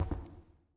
floor, Footsteps

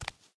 hard barefoot, Footsteps

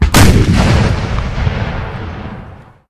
explosion high; Weapons; Explosives; Explosions

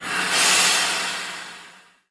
steam whoosh; Magic; Cults; ClockCult

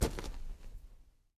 asteroid, Footsteps

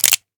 Revolver Cock, guns; Weapons

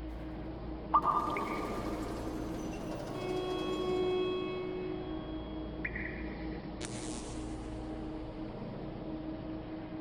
ambience

